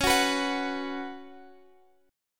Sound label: Db 7th Suspended 4th Db down; Db7sus4; strummed